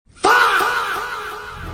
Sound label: faahhhhhh